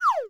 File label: trap trapdoor fall; Crypt of the NecroDancer